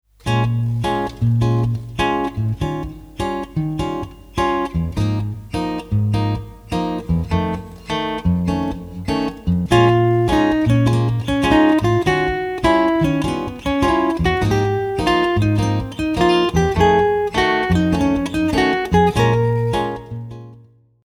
Shifted pattern in Ab

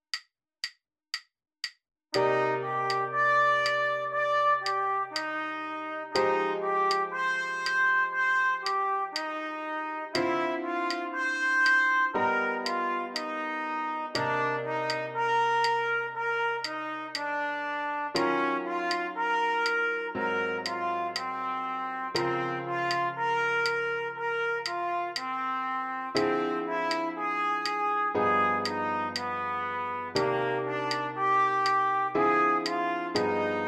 Open voicings, lots of tensions

